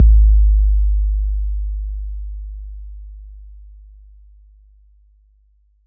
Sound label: BD 808 Decay